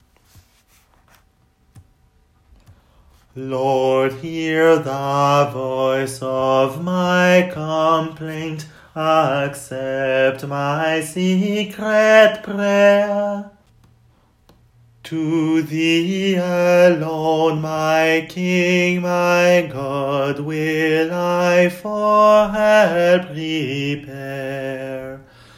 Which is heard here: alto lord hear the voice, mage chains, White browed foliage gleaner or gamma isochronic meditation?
alto lord hear the voice